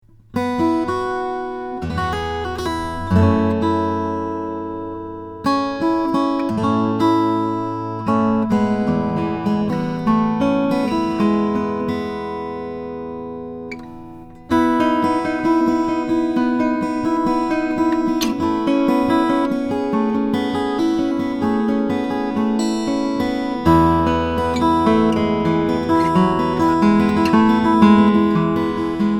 sexauer ft 000 es koa

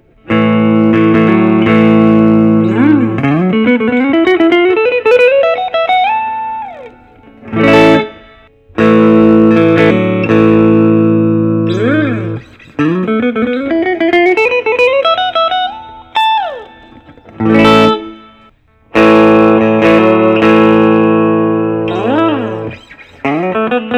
McInturff Carolina custom; tea burs

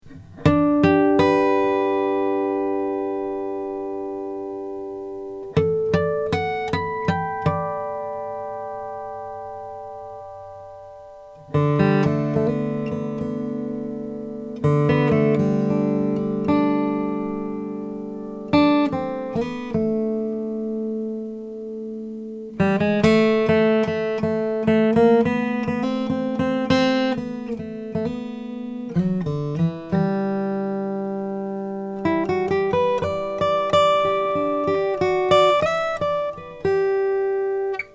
mcpherson mg 4 5xp tiger myrtle

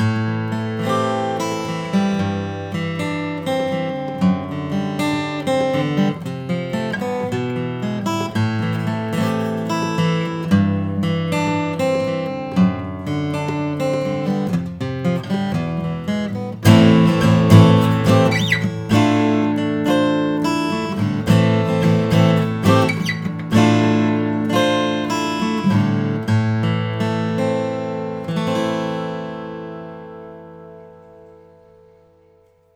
martin om 42 koa sunburst; 1935

